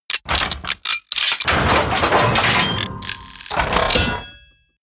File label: robot transformation metal large